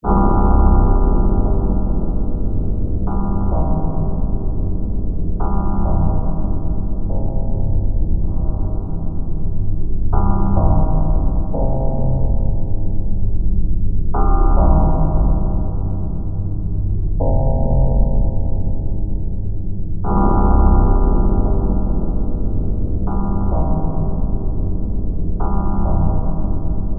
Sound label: Dark Bells Huge Bell, deep